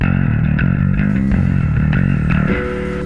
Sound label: bass